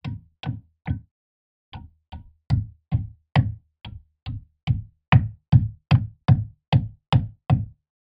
industrial tools hammer hitting nail in wall nextdoor; sound effects one